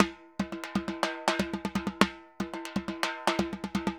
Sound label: timba, merengue; World Grooves; Best Service ProSamples vol.14; AKAI